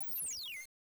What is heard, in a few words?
happy; pxt calliope